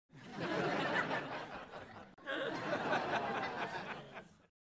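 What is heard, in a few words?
Crowd 100 Light Surges; medium int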